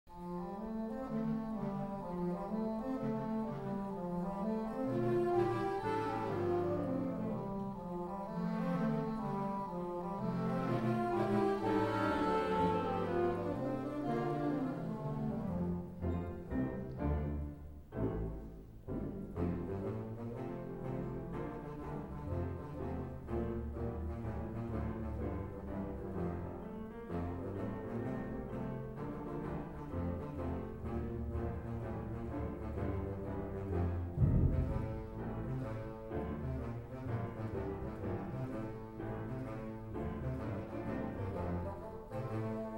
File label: music band; grainger